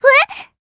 combo break

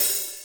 cymbal light

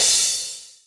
soft hit finish; osu

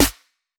Clap; osu

